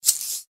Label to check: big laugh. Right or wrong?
Wrong